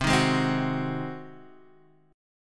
Ab 7th C

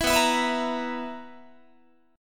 B Major 7th Suspended 4th B, Guitar; strummed

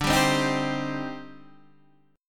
C Minor D; Cm/D strummed; JGuitar

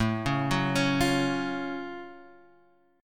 F sharp Minor Major 7th A

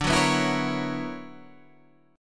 B Minor Major 11th D, chord

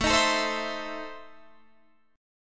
E Major 7th Suspended 4th Sharp 5th A

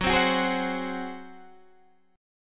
F sharp Suspended 2nd Flat 5th F sharp, Chord

